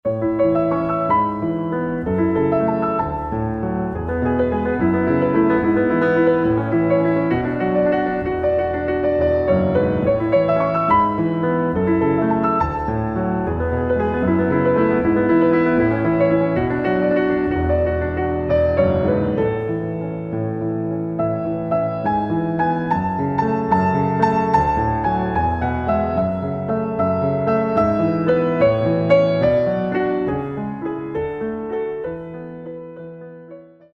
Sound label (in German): Kleiner Walzer